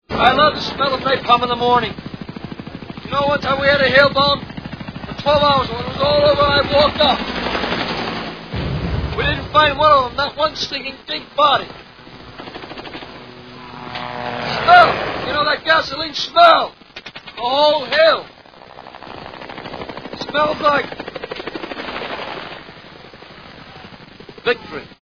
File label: napalm